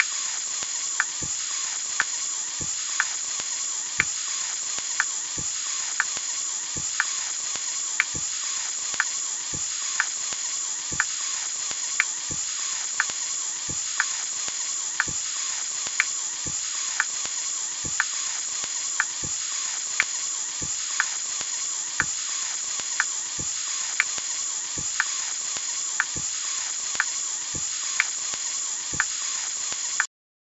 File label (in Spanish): Practica Ruido ritmo; ruidos